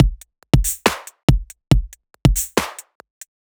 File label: Phosphor Break, Bpm 140, Dubstep, Drum Loops